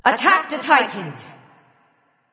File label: vox attack; smite